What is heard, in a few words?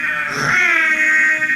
ghost, siren